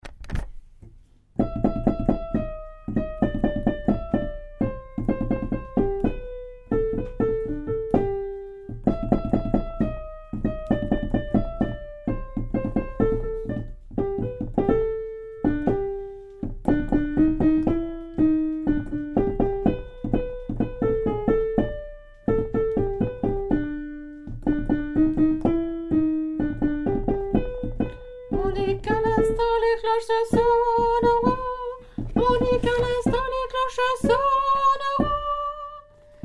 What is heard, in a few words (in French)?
soprano, chorale